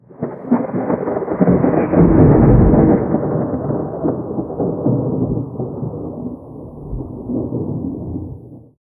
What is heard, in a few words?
the sound of a thunderstorm rolling in; atmospheric